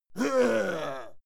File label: male fight pain; schreie; menschen; soundpool